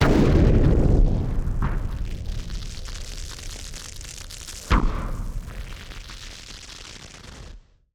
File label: Party Popper FX